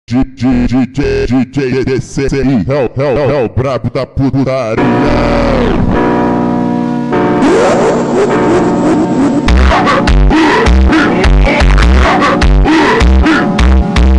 Phonk

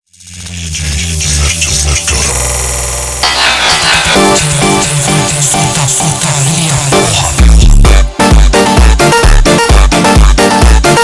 Phonk MONTAGEM

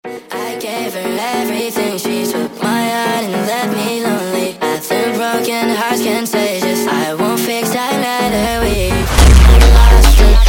Phonk

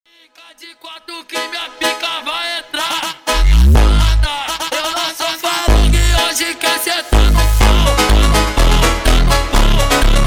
Phonk MONTAGEM Slowed

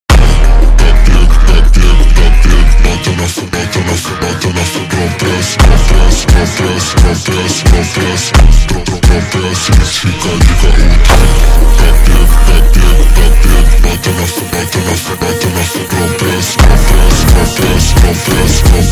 Phonk MONTAGEM Super Slowed

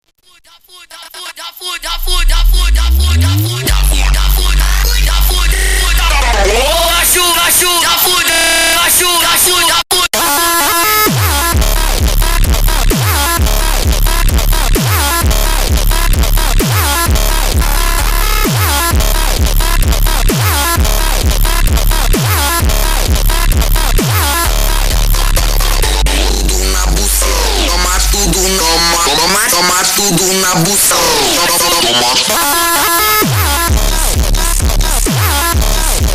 BEAT

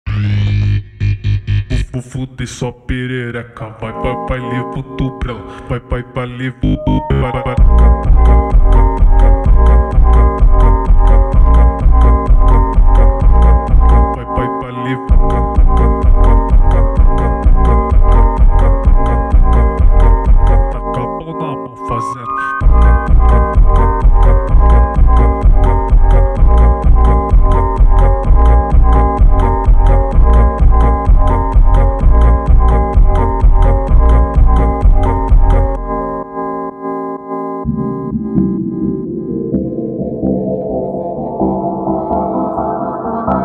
Phonk Slowed

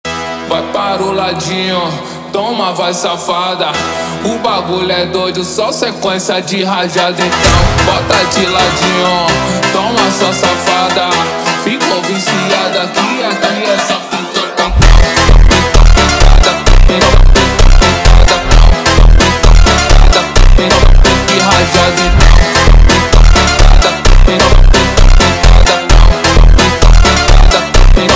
Phonk FUNK

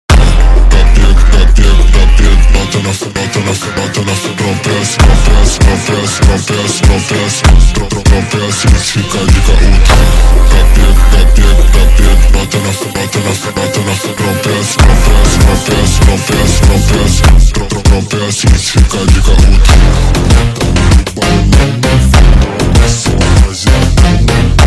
Phonk MONTAGEM Slowed

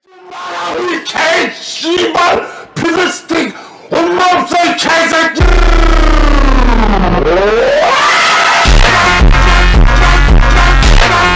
KOREAN FUNK

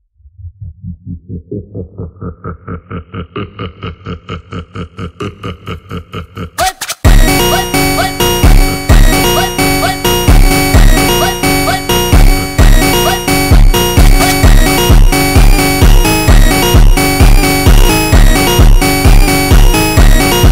Phonk AUTOMOTIVO

